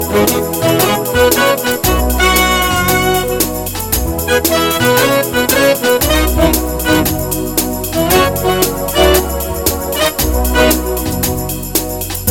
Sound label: A Smooth Swing